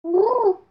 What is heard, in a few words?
Owl Grrru